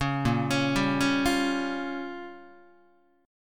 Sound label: Bb Minor 6th Bb down Guitar; arpeggiated; JGuitar